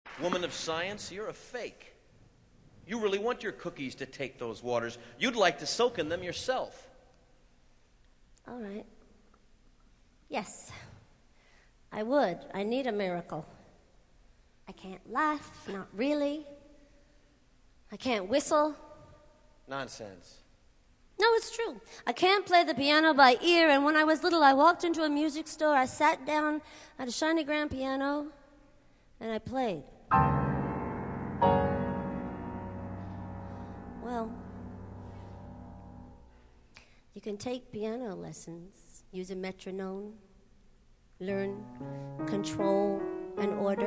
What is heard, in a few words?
Whistle Introduction